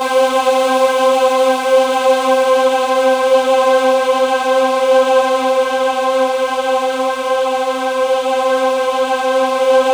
C5, Synth Line; 90 s Sample CDs; NiceBeats; SynthLine VOL-30

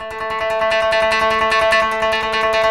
KOTO TREM

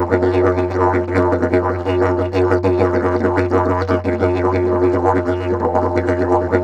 F# BURBLE, Didgeridoo; Big Fish Audio - Didgeridoo and Other Primitive Instruments; BURBLDRONE

